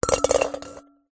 foley plastic objects x2 small hollow drop into metal bowl